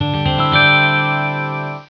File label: String + Bell; Alert! 1.0